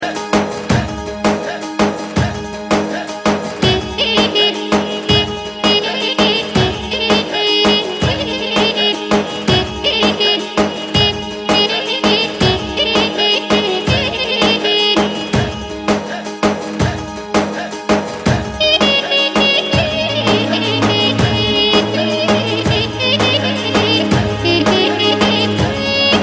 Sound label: Sax Beat; funk